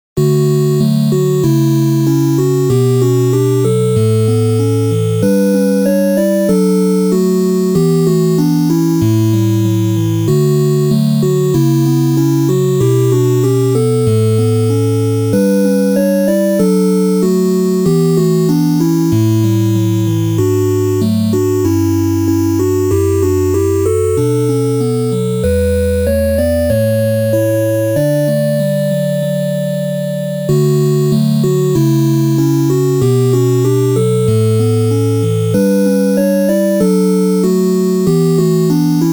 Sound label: bgm 8bit; maou